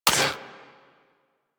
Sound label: Pistol Mech; Warframe; Tenno; Priest; Weapons